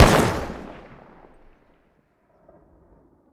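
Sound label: weapon mike203 fire player atmos, launcher; gmod; viper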